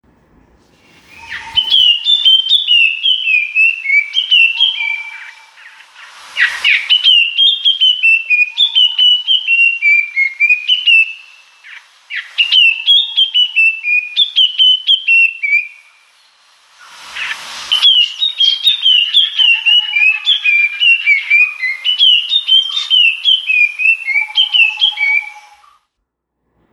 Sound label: Puff throated babbler